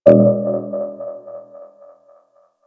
Ominous Techno Hit; Ambience; Warframe; Corpus Railjack

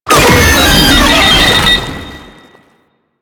Brachiolyst Death, Vocals; Enemies; Warframe; Proto Infested

